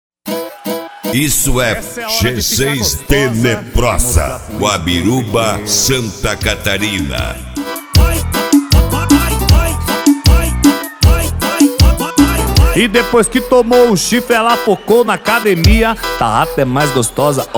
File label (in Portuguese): sertanejo automotivo